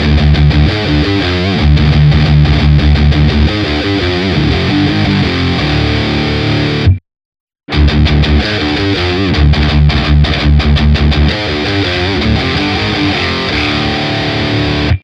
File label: Tight controls minimum and maximum Les Paul